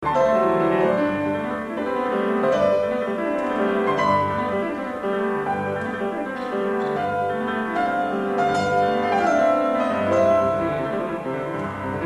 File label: Prelude in G Major Vivace